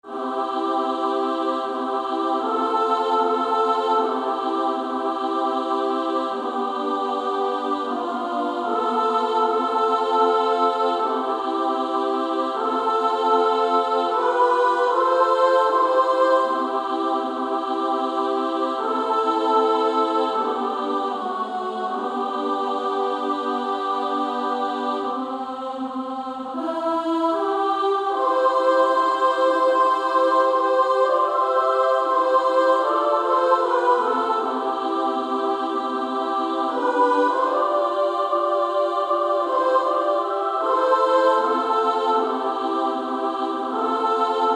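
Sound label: soprano soprano alto; Low in the Grave He Lay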